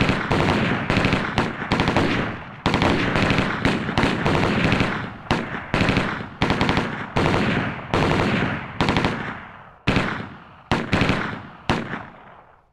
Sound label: gunfire, ambient